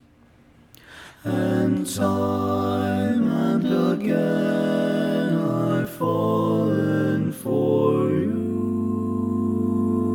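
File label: Baritone, barbershop tags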